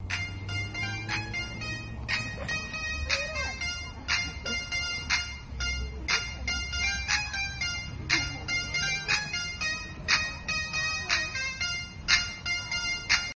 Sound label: Iphone 5 New